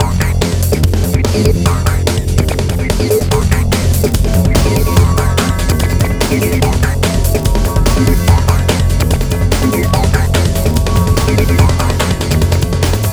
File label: Hardcore Breakbeat, 145 Fmin; Big Fish Audio - Hardcore Breakbeat Action; Hardcore Breakbeat Action CD1; 05 Engine